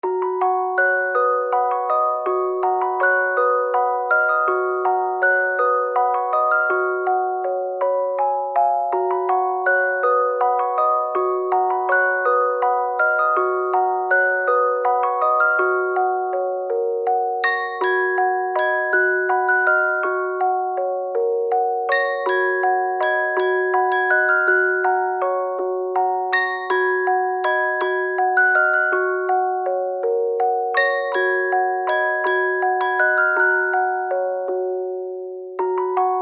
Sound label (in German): orgel